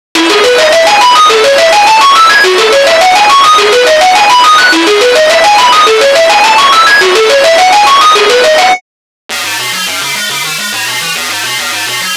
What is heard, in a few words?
leads